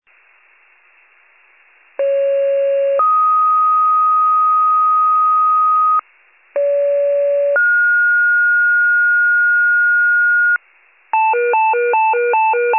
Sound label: FIRE pager alert